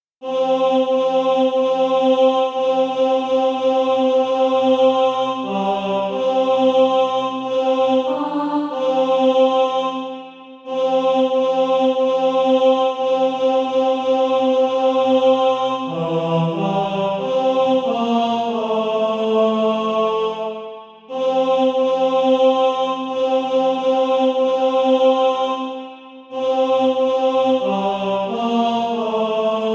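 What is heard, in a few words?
tenor, Practise Music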